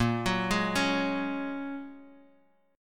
A sharp Minor 7th Sharp 5th A sharp, Bass Standard; arpeggiated; 18,21,18,18